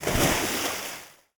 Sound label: jump begin water; Mount&Blade Warband